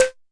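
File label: mbrute percussion, Arturia MiniBrute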